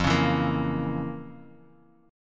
F Major 7th Suspended 4th Sharp 5th, Guitar, Open D; 3,4,2,4,x,x; down; chord shape